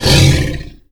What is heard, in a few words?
Growl; blastmorph pinball; xbox series xs